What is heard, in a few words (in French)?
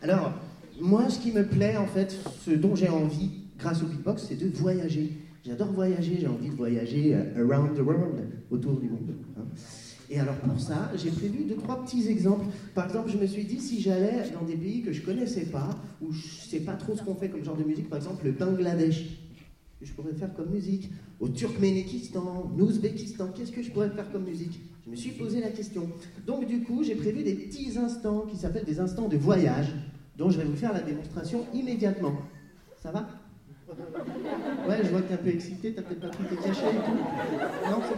Ambiance Bengladesh